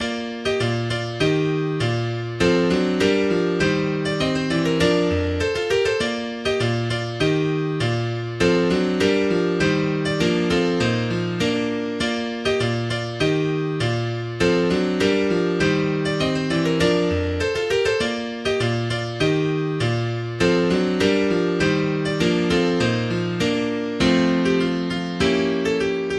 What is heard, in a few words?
Chorale